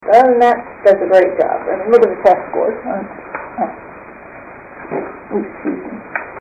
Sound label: teacher farts during parent teacher conference; zens teacher